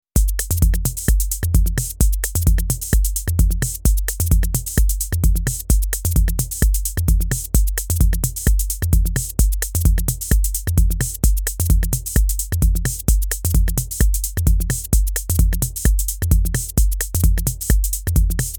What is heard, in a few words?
Roland TR 08 Shuffle